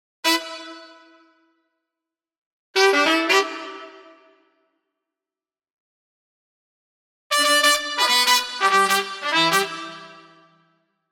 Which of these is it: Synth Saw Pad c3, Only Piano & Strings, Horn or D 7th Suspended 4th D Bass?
Horn